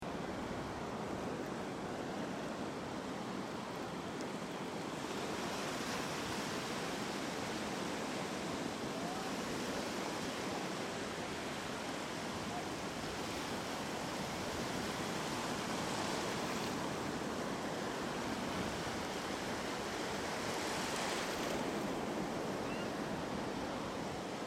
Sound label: Ocean Beach Sound Of The Sea With People In The Background; Crafted Signals